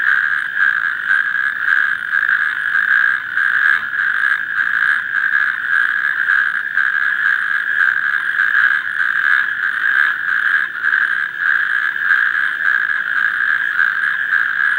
Epidalea calamita

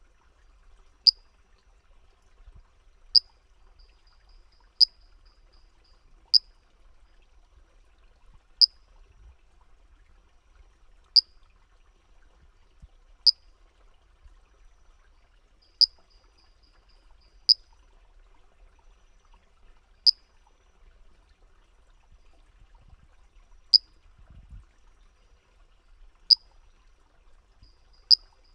Vitreorana uranoscopa

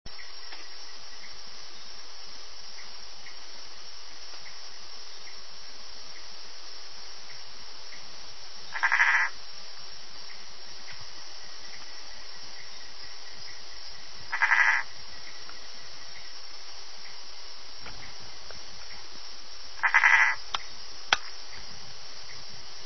Bokermannohyla hylax

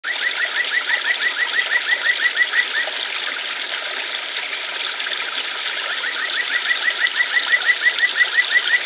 Bufotes luristanicus

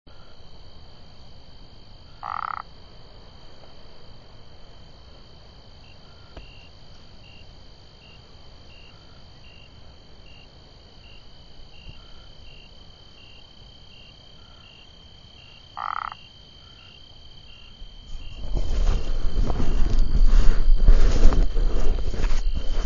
Phyllomedusa burmeisteri

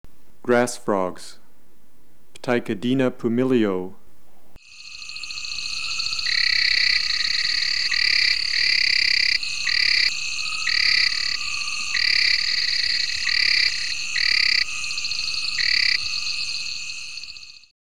Ptychadena pumilio

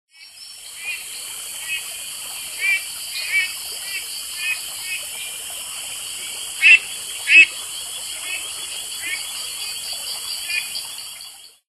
Boophis reticulatus

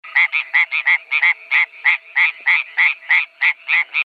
Hyla savignyi

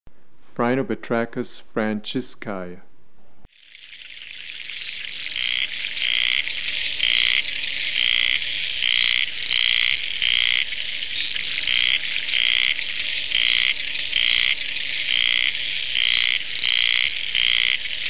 Phrynobatrachus natalensis

